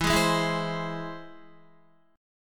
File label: E Augmented, Guitar; strummed